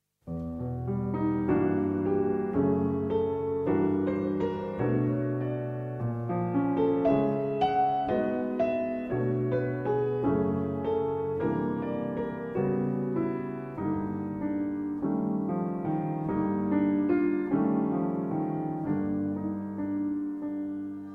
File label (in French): Quatuor pour piano, violon, alto, violoncelle, Largo; Quatuor No. 2; Op. 183